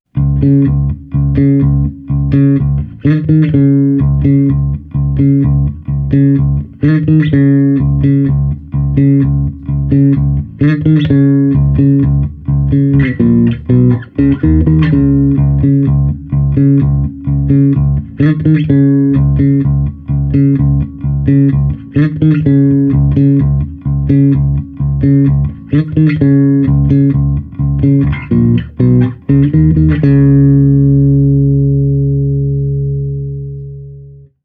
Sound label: Jazz Bass – clean, fingerstyle